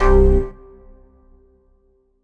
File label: Dance Mega Synth; Best Service; 90 s Sample CDs